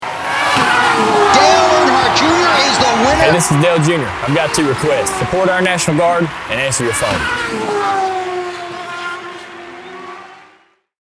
racing ringtone

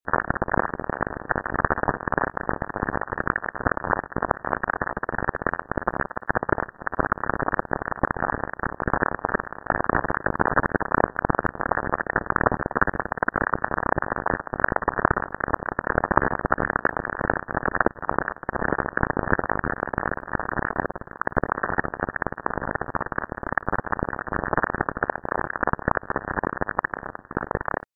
saturn lightning

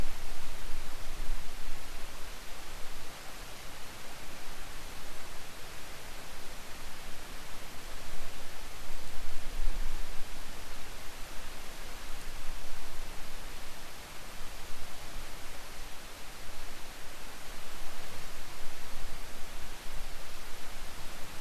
light north wind

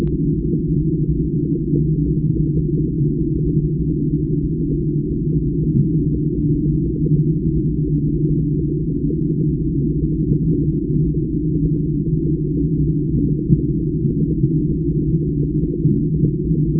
RonBrown arrival, acoustics env-noise; 00N 110w

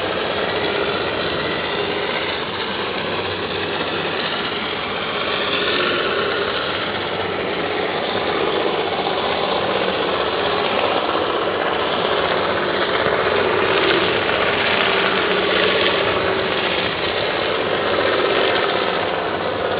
ship, acoustics; pioneer

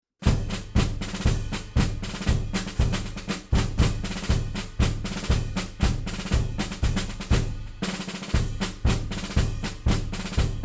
Drum Cadence; 392nd; ceremonial music

